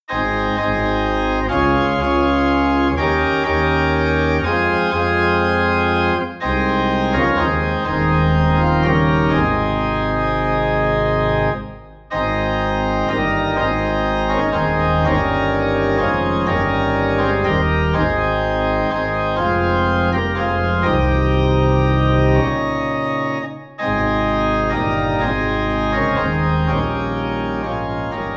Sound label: In a Cave - Organ Low in A Flat; christmas